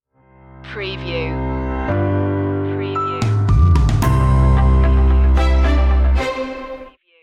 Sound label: happy go lucky stinger